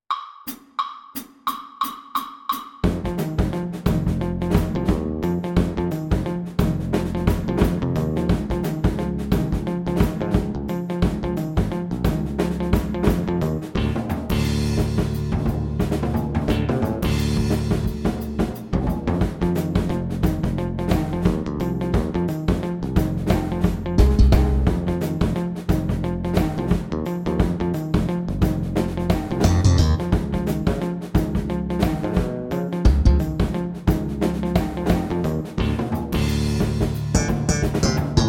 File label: Guitar PLAYALONG Jazz 2nd LINE; NMEA Jazz Etude